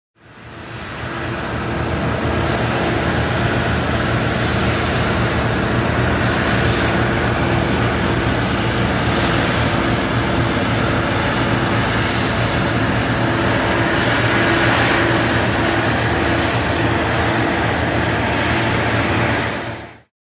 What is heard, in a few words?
Combine